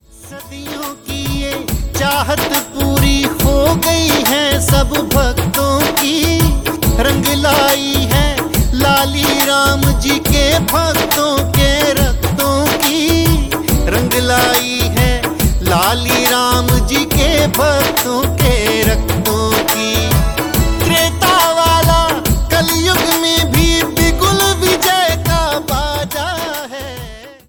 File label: Bhajan Ringtone